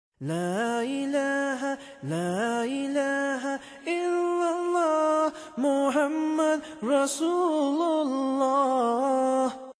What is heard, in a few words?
Islamic Tone Shahada